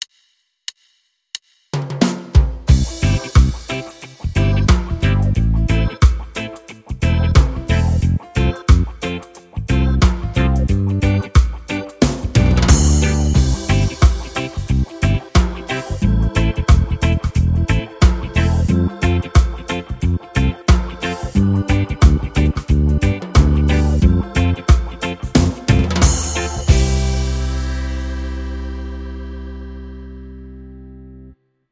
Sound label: Chord Exercise; Chord Exercise 2A